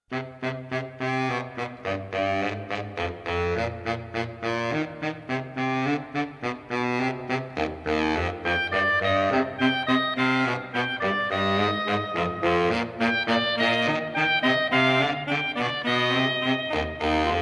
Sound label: ground bass